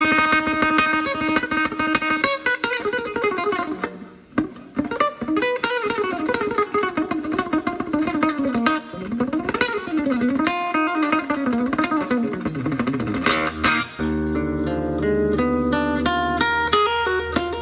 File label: guitar amazing acoustic